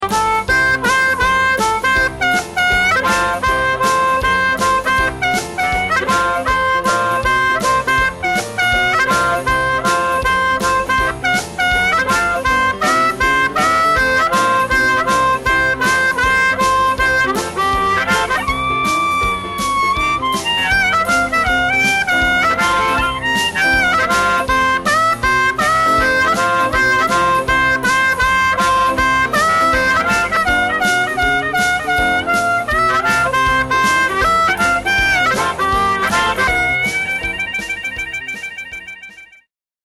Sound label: swing harp